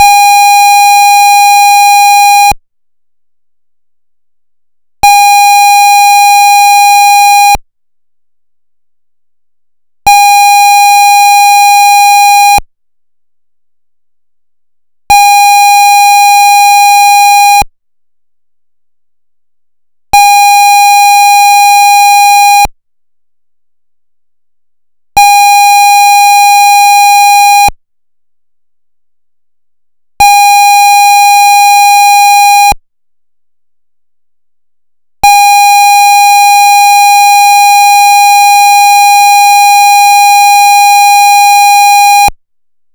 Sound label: Interrupted IMO SOLAS